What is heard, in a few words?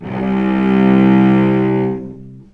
VCS ATK C2 L, VCS ARCO, String Collection